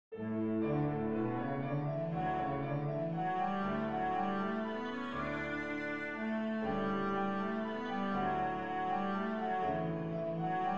danse allemande; beethoven ludwig van

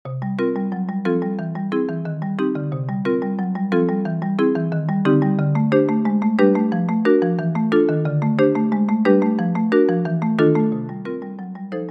pour marimbas

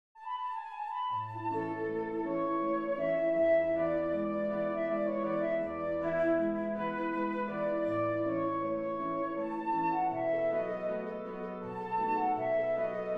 menuet quintette cordes; boccherini luigi

